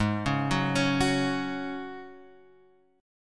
Ab 7th Suspended 4th Ab Guitar Open F; Ab7sus4; x,3,1,1,4,1; arpeggiated; chordshape; JGuitar

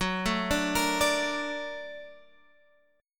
A sharp Augmented Major 7th

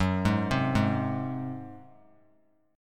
F sharp Suspended 2nd Flat 5th F sharp, Chord; arpeggiated; JGuitar

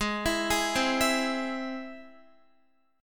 Ab Augmented Ab, Guitar, 4E, 4A, 5D, 5G, 5C, 6F; Ab+ arpeggiated; JGuitar

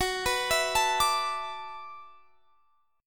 E 7th Suspended 2nd Suspended 4th, Gb, chord

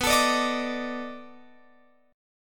B 7th Sharp 9th, down, Guitar, Open F; B7#9; strummed

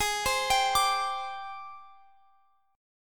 G sharp Major Sharp 11th, Guitar Standard; G#M#11 arpeggiated; x,x,18,17,20,22; JGuitar

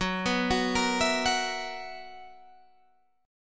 B Major 7th Suspended 4th F sharp

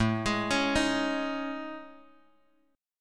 A Major Sharp 11th, Bass Standard; AM#11; arpeggiated; JGuitar

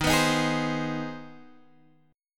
C Minor Major 7th Sharp 5th Eb

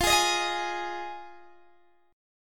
F Minor 7th, F, Guitar, Open D; down; chord shape

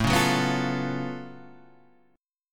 Gb Minor Major 11th A

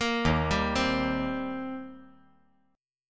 D sharp 6th, D sharp, down; arpeggiated; JGuitar

